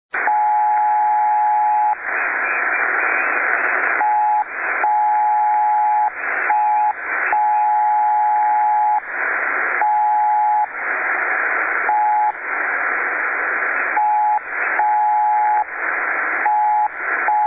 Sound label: noise; KC0DGN